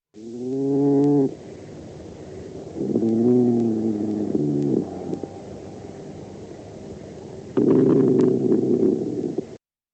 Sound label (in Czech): Jezevec lesni